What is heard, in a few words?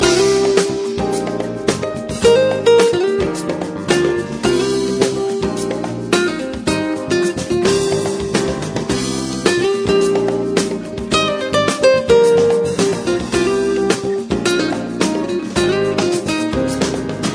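guitar; Jazz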